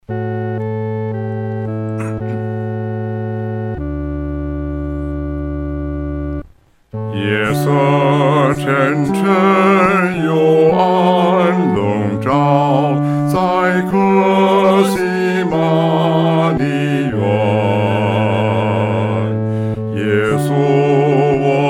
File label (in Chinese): 独唱 第四声; 到客西马尼